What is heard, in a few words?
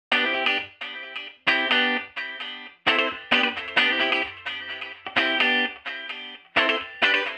Delay FX ON Electric Guitar; DelaySon